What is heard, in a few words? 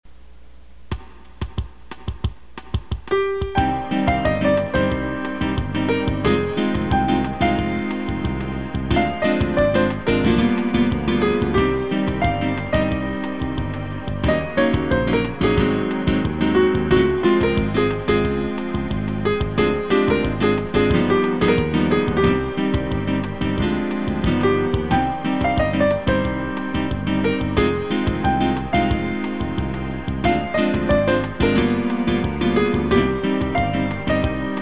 MIDI